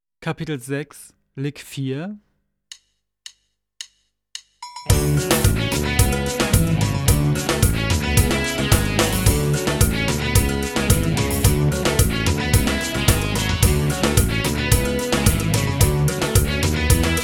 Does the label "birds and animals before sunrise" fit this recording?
No